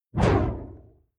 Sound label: Whoosh Fireball Whip Fire Away Flame Heavy Doppler